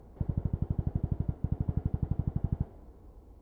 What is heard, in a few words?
distant gunfire